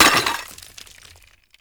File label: Destroy Planter Hanging; Warframe; Ambience; NineteenNinetyNine